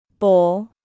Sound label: bowl